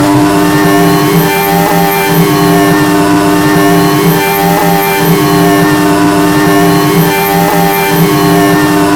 V6T off max; AOS F1 2022

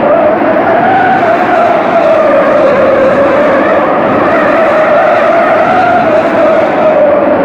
skid dry, Tires; Kart

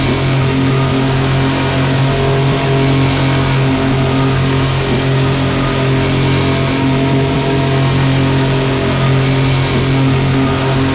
ex PMED; Germany; AOS F1 2022

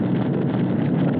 fire; AOS RF1 Kart Demo

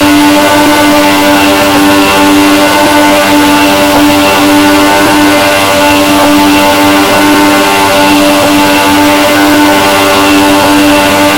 ex on max; AOS F1 2022

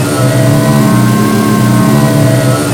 V6T off low; AOS F1 2022

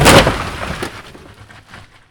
impact car light; Kart; AOS RF1 Kart Demo

